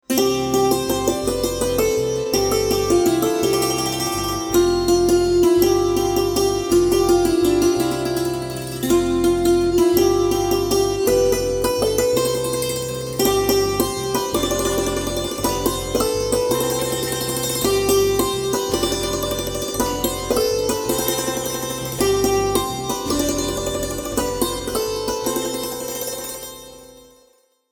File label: Tune Santur Mix